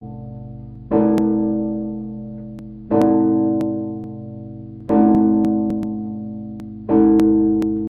bell

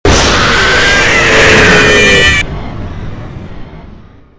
jumpscare sound; tealers the diferent chapter

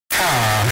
minecraft villager bass boosted

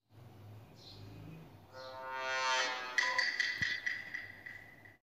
impostor sound; among us

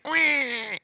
wahh

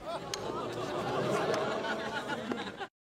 sitcom ooh

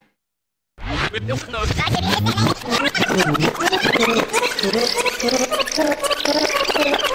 rewind

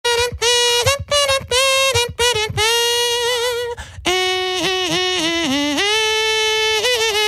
beat box; the most amazing beat box video ever

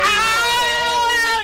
loud ahh scream

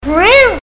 ueeeeeu

